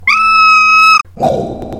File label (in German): dampflock Kollision; helpTheTrain